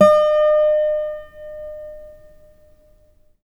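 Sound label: GUILD 12-string, Guitars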